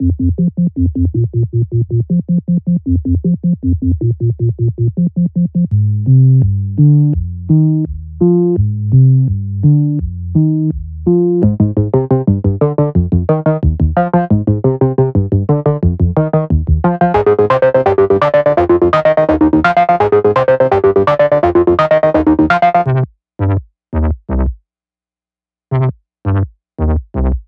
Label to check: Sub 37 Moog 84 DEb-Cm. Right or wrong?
Right